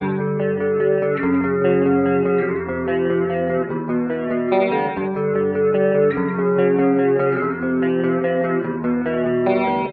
guitar, Sad

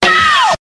ow

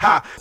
"ha", Voice

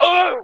"ooh" male

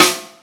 powow, snares real

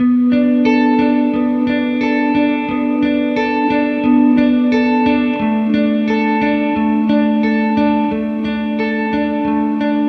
guitar wrenching, Sad; Loops

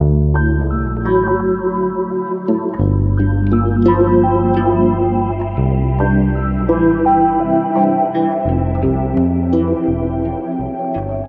keys

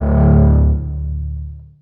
growling, synths; Notes

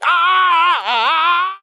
"ah", Voice

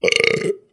burp short

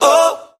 "oh" sung; Voice

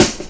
snare, Real; Snares

